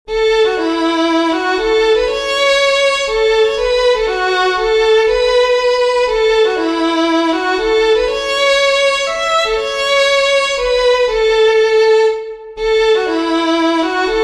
WALTZ, fiddlers; southern kings fiddlers

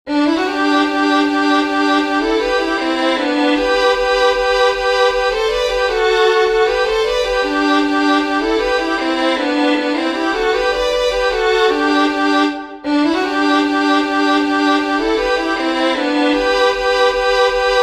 LUCY FARRS with harmony, fiddlers; southern kings fiddlers

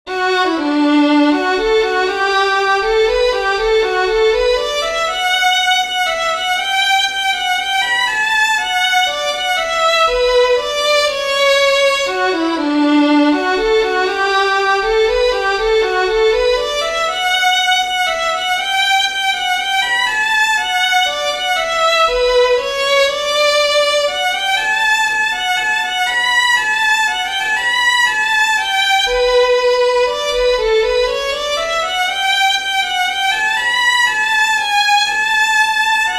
WALTZ, fiddlers; MOONBEAM; southern kings fiddlers

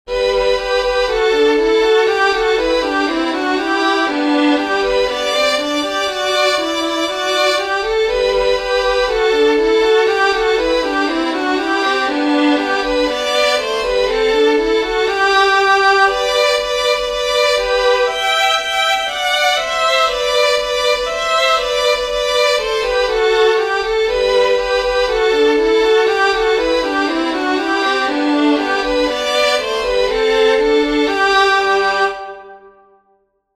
WALTZING MATILDA with harmony, fiddlers; southern kings fiddlers

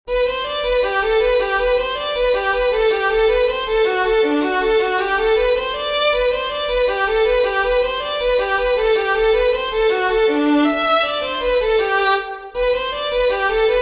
WEST POINT REEL, fiddlers; southern kings fiddlers

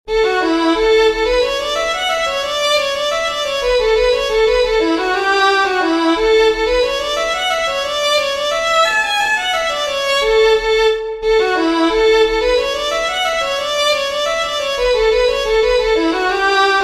REEL, fiddlers; RED HAIRED BOY LITTLE BEGGARMAN; southern kings fiddlers

